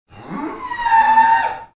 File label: cow cry